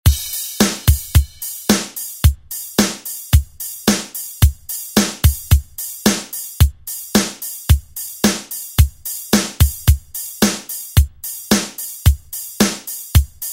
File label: 80s Re Drum; DJ Mhark